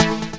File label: chord A; Techno Trance Essentials; CHORDS